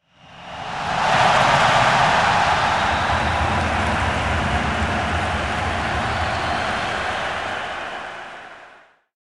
Crowd Cheer Rising Audience Reaction Very Large Sports Stadium Crowd; Fan's Clattering In; Hollywood Edge; sound designer's toolkit v2